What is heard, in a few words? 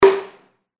hit; Triple Dragons